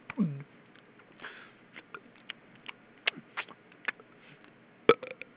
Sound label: gulp